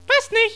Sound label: wrong